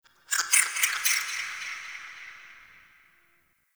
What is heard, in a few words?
Creature Distant High; Ambience; Misc Outdoor; Warframe; Infested Eris